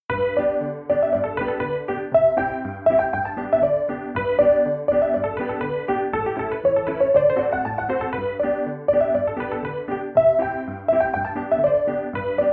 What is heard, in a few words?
Folk Piano; Sherlock Holmes The Awakened